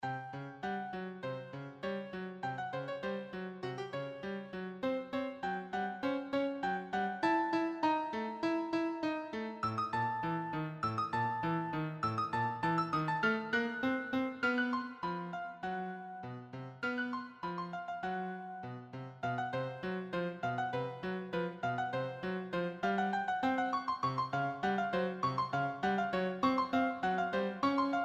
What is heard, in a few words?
Short solo piano; solo pieces